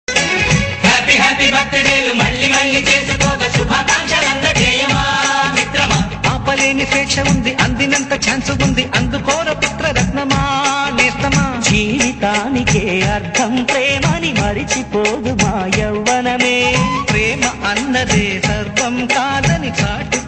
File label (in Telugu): telugu song; suswagatham; pawan kalyan; devayani